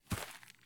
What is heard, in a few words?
run grass low; Escape from Tarkov